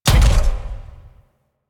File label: Mech Step Run; Warframe; Vehicles; NechroMech